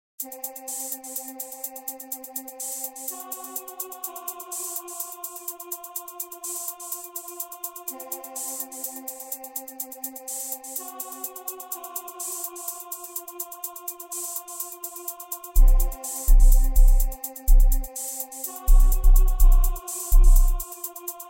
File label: bass waves subsonic, Hip Hop Rap